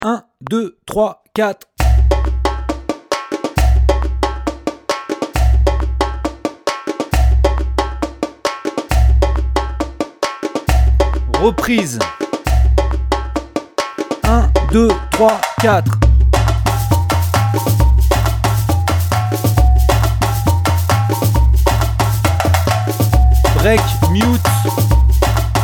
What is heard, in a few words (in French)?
funk 150 sans caixa